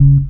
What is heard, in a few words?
Elc Bass; Equipped Music